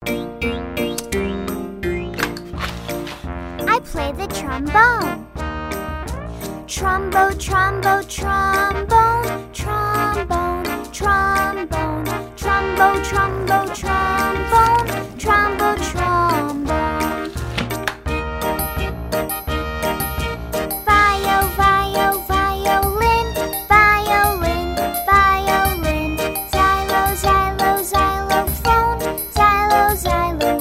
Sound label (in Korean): 영어 여 여아 동요